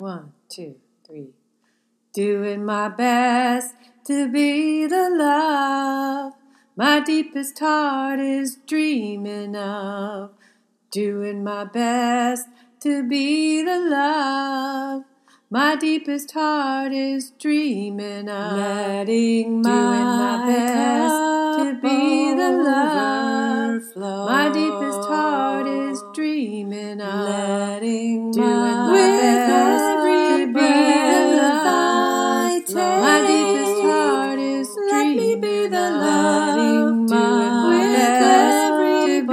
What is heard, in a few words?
all parts, choir; freedom choir